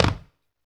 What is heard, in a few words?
STAM, Human Perc, Body Perc